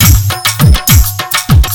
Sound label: Indian Tribal Beat